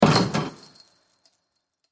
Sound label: Sports Basketball Chain Net Swish Shot Backboard Rattle; SFX Bible